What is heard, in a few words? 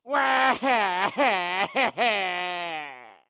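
sounds of male crying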